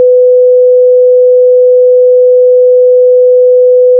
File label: sine 500Hz 1dB